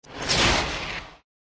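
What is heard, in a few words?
Fire Spirit buff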